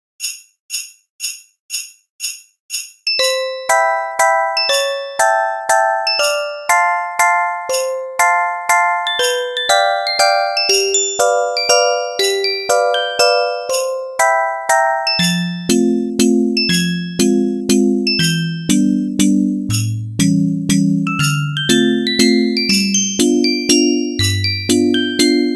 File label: Machikado orgel mix